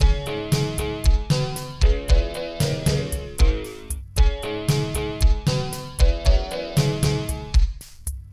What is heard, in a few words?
Boss Intro; Vagrant Fury